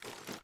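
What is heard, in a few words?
reload extended mag lift; gmod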